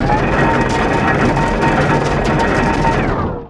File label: rocket barrage active; weapons; dota; gyrocopter